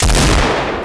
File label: deagle; weapons; gunsound; cstrike; cs16